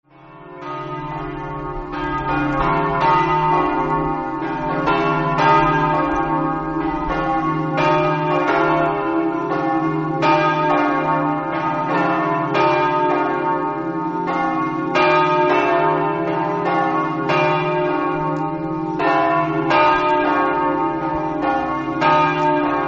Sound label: campane; pastore